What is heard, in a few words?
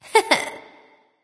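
"heh heh", nonverbal; smite; voicepack; khepri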